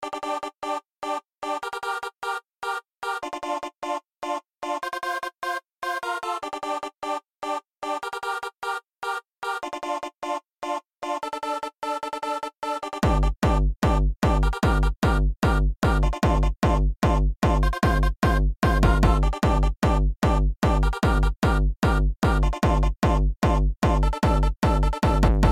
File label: Techno House Trance